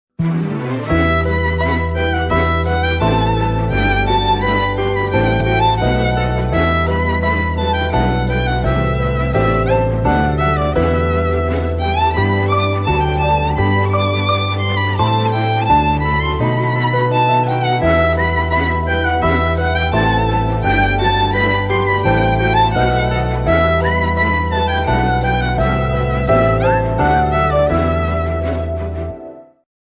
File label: dark bgm; deiva thirumagal ringtone